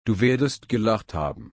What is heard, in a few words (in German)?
lachen